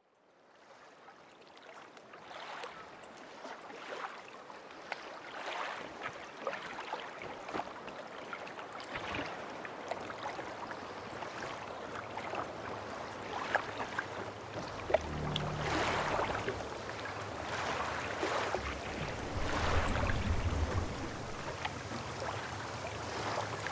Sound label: Gale Cliffs Ambience (Night)